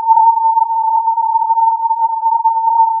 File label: filtered input